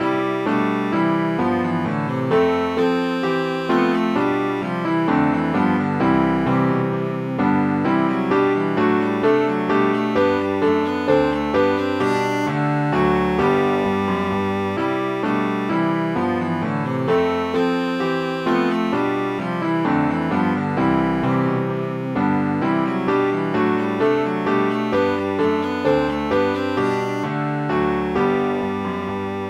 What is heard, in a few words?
bourree, cello; suzuki cello school